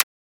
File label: Transient; FLPRO